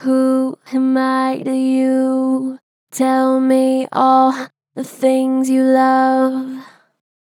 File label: Str Flat Who am I to you, Vocals, Pitch Flat Strong